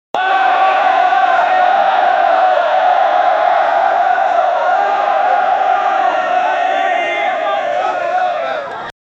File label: excited cricket players, cricket